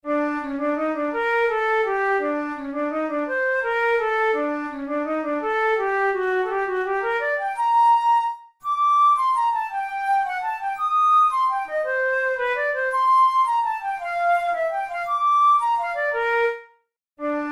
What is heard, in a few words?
studies for the boehm flute; drouet 72